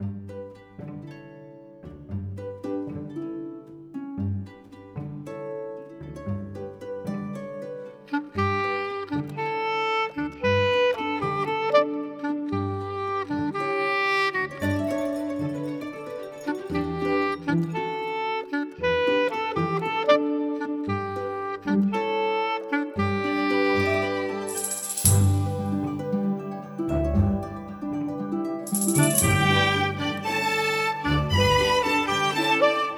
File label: Indoor; Baldo: The Guardian Owls